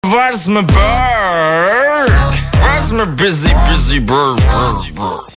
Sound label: bird jingle; Waarsmebird